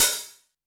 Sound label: hihat open, electronic